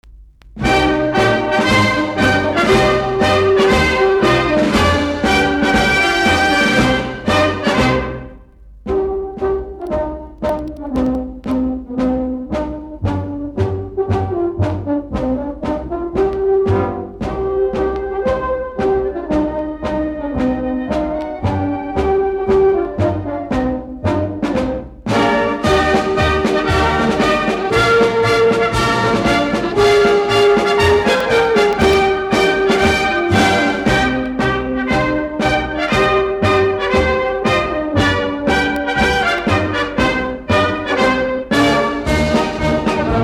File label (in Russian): Варшавянка - духового оркестра